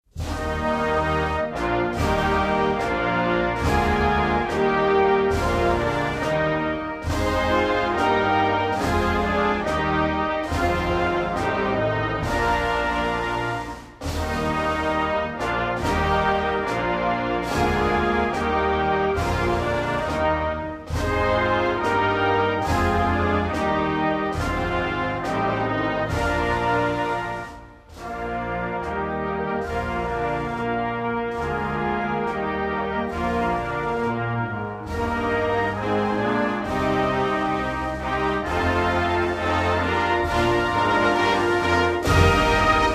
German national anthem performed by the US Navy Band